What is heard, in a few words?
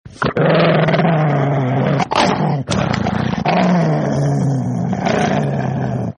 Agressive Pitbull; Very-Funny-Ringtones